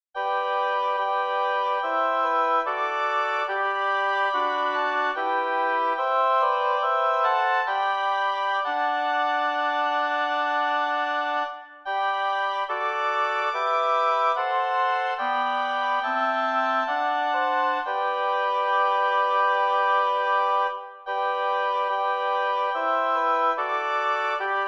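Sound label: lyrical oboe quartet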